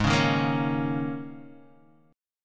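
Ab Suspended 2nd Suspended 4th chord, Guitar, Half Step Down tuning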